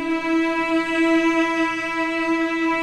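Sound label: E4, strings; East West; The Ultimate String Collection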